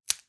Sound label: fruit Fall; feed the frog